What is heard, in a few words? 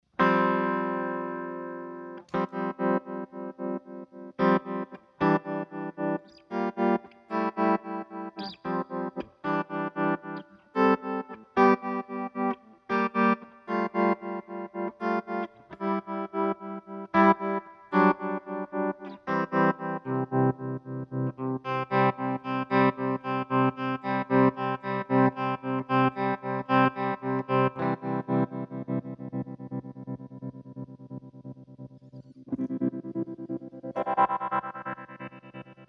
empress tremolo, Rhythm 3, two speed mode, depth full, tube wave setting